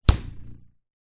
foley tape stretched tight long pull release twang